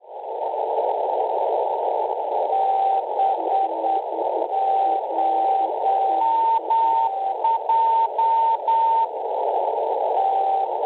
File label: NDB, 404 kHz; LRD; Lerida; Spagna; Schwarzsee